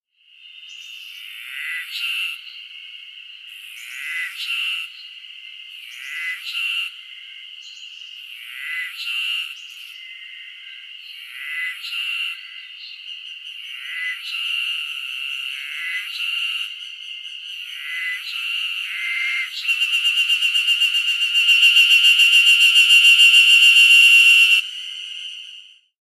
ezo haruzemi

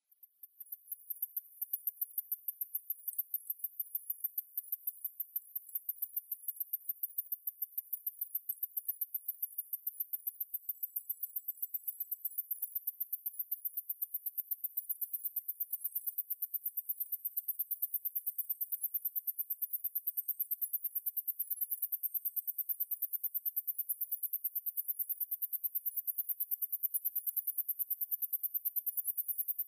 sesuji-tsuyumushi

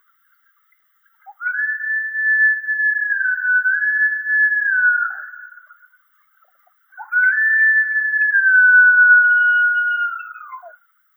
shika

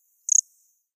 kuma-koorogi

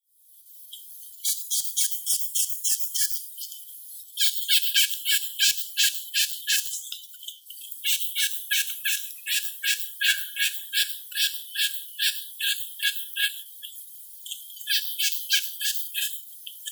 ootobi sashigame

